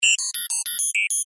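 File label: robot sounds; zvuki robota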